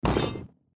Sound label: wrong; Candy 3D HTML5